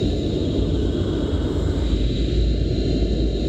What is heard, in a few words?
arcane loop; smite; god merlin